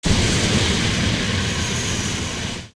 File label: Flame; King Dodongo; zelda; OOT